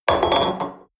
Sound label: espresso upset table medium, restaurant cafe